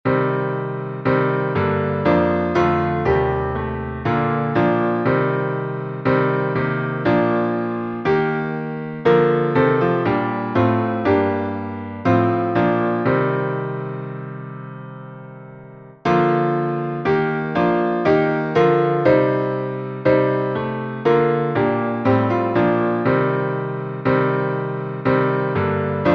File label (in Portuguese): salmo playback